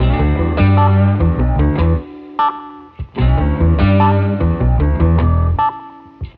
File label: guitar, Phonky Loops